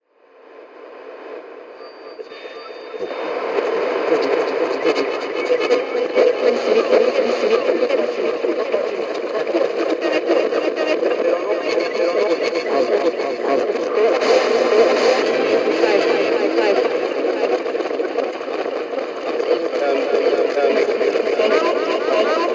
Eerie Radio Static; communications

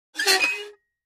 Metal Scrape On Metal

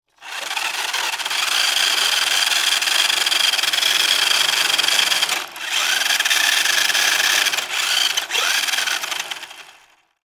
Rc Car Radio Controll

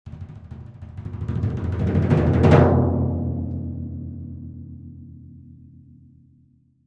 Timpani Hands Low Cre; mallets timpani

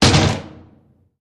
Object Landing On Lid, drum

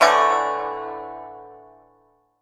Music Hit Ethnic, orchestral

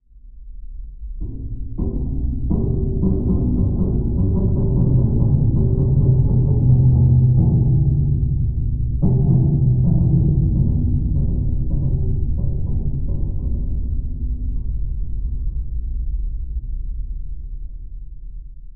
Underwater Int Low Me, metal groan atmospheres

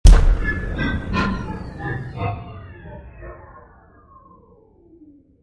Computer Impact Power, stinger

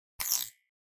Button Sci Fi Beep T, buttons & beeps

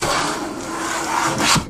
Hand Planing Wood; planers

